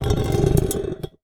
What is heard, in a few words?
Drag, Vase